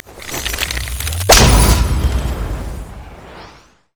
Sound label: gate end; LoL; twistedfate